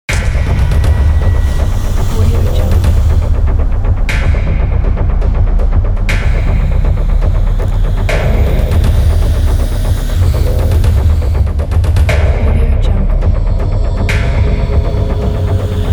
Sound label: in suspense background